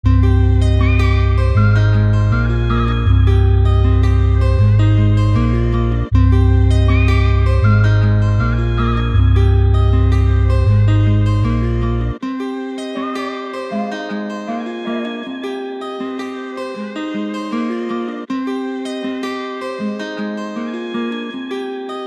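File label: UK Rap 158bpm C minor; Trap&R&B; WavGrind Crystal Producer Bundle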